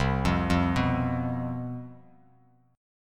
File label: C Major 7th Suspended 4th, down, Bass Standard; arpeggiated